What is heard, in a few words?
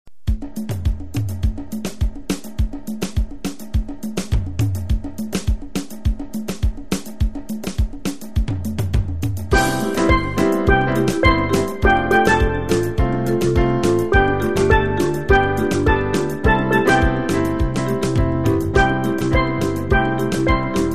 3 Note Samba